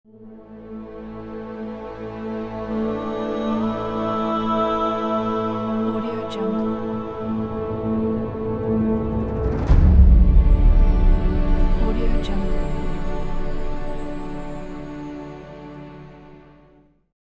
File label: the logo